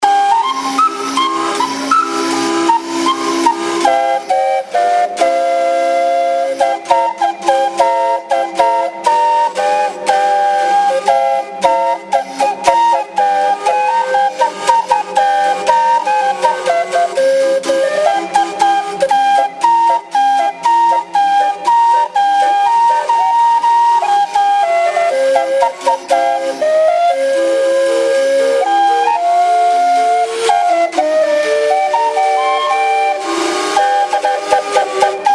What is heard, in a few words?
calliope barge